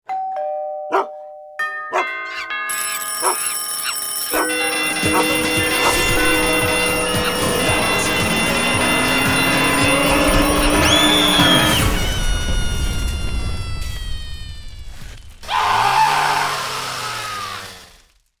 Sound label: doorbell; Rocky